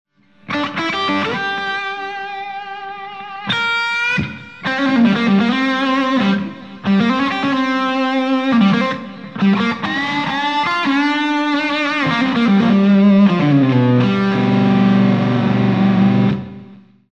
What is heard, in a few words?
SD1 Full Gain